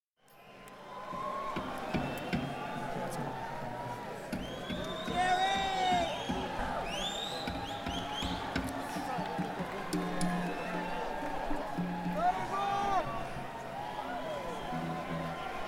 Crowd; schoeps

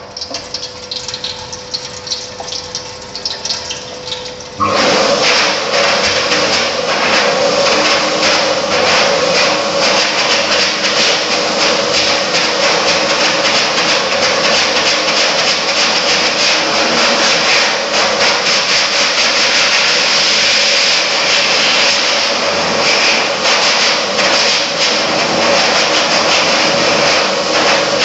hand dryer solos, abbey; tintern

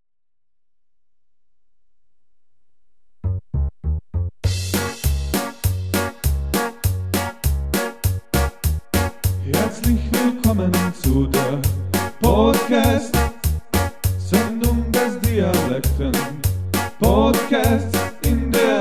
Jingle Dialekten podcast

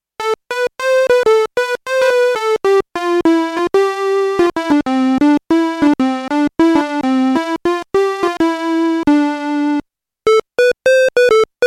Square; Bank 2 card A

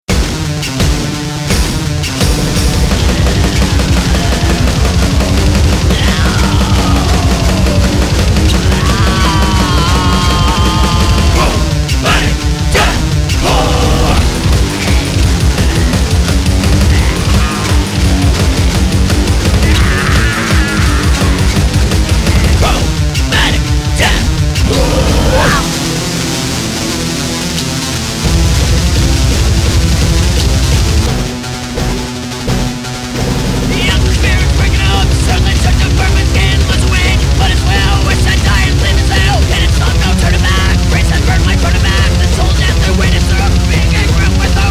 chromatic death

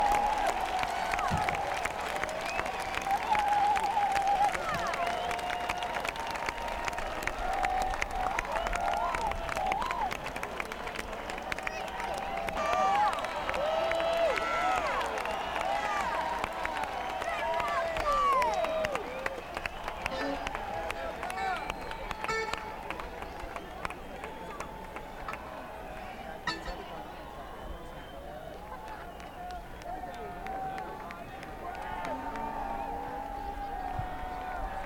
Encore Break

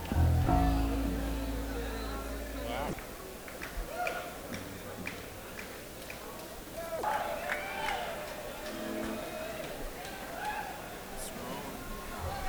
Crowd

